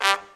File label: SECT BRASS FX